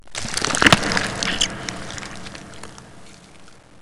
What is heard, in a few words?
zombie female head down; left4dead2